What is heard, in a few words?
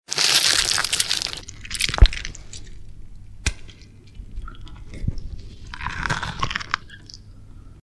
Flesh Rip